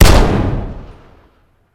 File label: fire dist int pistol heavy; gmod; arccw uc commons attachments